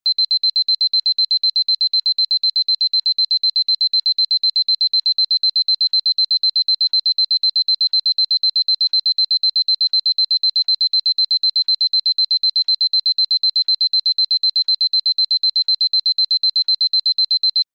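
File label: zvuk budilnika, alarm clock sound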